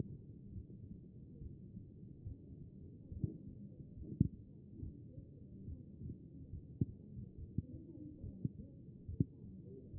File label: CHEST pos4 B MODE